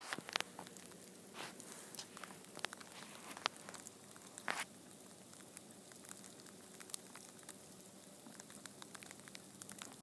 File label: Sleet Falling